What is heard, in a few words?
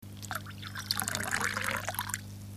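chick water tea; hiyoko; ゆめ2っき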